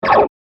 Laser Bug Blaster, laser gun, science fiction sound effect; star wars / trek style games